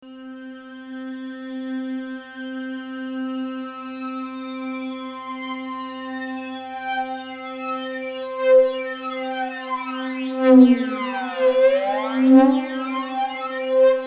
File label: trident; Les Indes